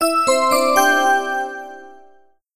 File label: chime